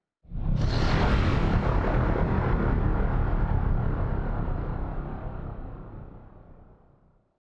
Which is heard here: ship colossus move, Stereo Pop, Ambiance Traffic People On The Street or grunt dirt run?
ship colossus move